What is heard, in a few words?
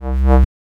mod bass, Mod Drums; Synths and FX; neuro; Sinistarr